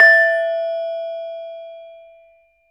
LAMEL E4 -R; USB Soundscan vol.60; AKAI; LAMELOFO

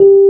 AMB ROAD; Keyboards Old School; USB Soundscan vol.09; AKAI

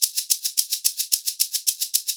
110 SHAKERS, Percussion Loops; USB Soundscan vol.36; AKAI

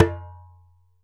ASHIKO, African Drum; E-MU Producer Series Vol. 7 – Old World Instruments

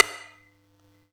Yamaha EX-5

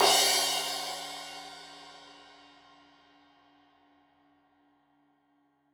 Crash 15in L edge, Cymbals Stereo

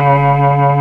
CELLO, MELLOTRON; East West - Global Sounds

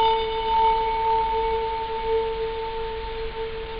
Ambiance Ting; Williams; jazz jackrabbit 2; M8

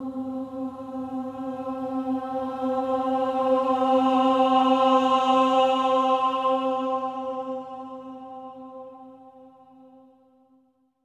AH C4, swell ahs, Symphony of Voices; Spectrasonics

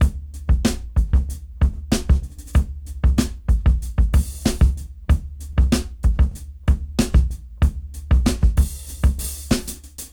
Rap Dry 94 BPM; AMG - Keith LeBlanc Phat Butt Jams

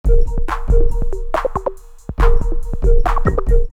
Loop Dis 3 140, drumloops Rave; X-Static Goldmine; 90 s Sample CDs

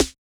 SD00 T8, Yamaha DJX-2B

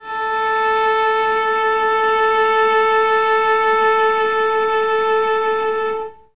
Cello solo A3; Best Service ProSamples vol.51 - Classic Orchestra 2

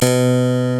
CLAVECIN, HARPSICH

